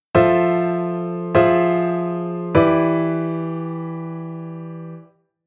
Chord IVmM7

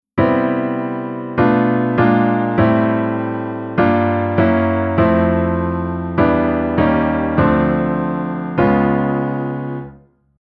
Chord Reharmo

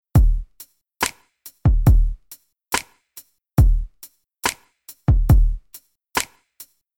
Rhythm RnB

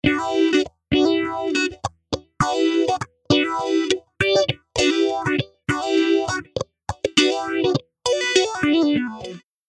Phaser After

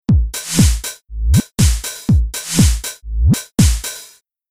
Rhythm

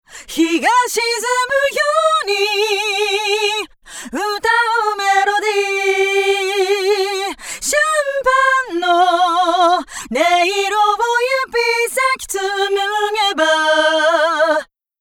Chorus Flanger Flanger

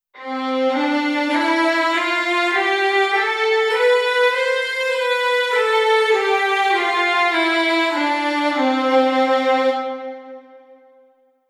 WW Strings

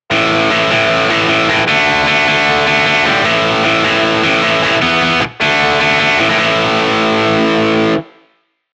guitar Power Chord

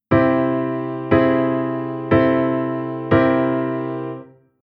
Chord aug

